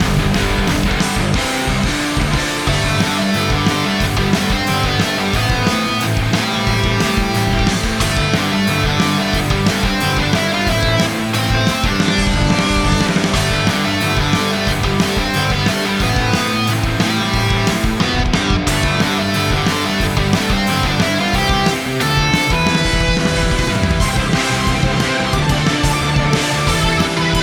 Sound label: heat battle bpm180